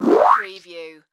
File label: Amb power